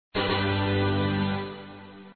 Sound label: change level; Sports Games; BasketballPractice